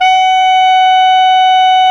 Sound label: clarinet, Clarinets